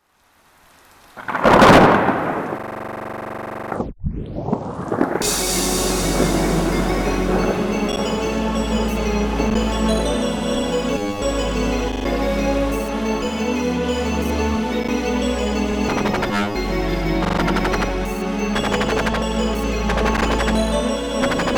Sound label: drumstep remix; Raichu Fan Disc